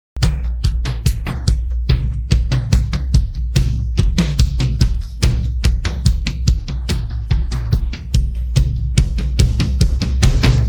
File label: pattern, drums